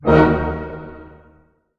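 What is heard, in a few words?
D# minor pianissimo, orchestra hits; Sound On Sound - Peter Siedleczek's Orchestra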